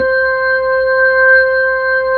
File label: B-3, Keys of the 60s and 70s